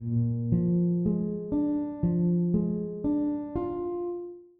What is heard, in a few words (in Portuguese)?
Si Bemol setima